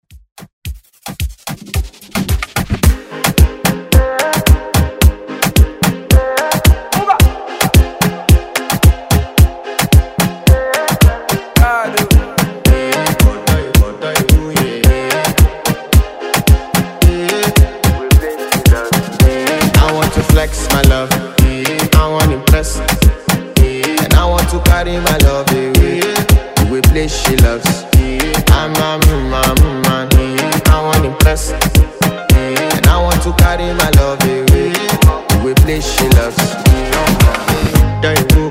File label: Afro Rton; Redrum